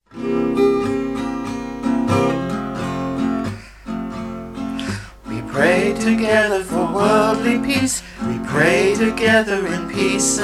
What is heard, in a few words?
Lightworkers Chant